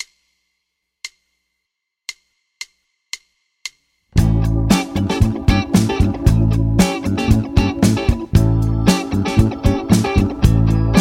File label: Funk Bass Playthrough 115BPM; Bass Road; Bass Guitar 101